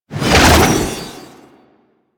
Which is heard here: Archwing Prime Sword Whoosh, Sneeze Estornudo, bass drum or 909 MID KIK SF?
Archwing Prime Sword Whoosh